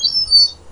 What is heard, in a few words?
seagull Whistle WE-OH